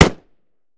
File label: mike4 fire last, weapons; gmod; viper